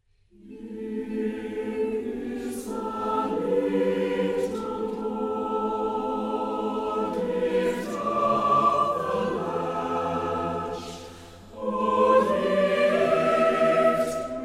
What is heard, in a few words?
Choir; Here Is The Little Door; Brisbane Concert Choir